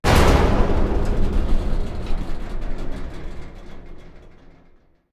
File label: Elevator Shudder; silent hill homecoming 2008 gamerip pc ps3 x360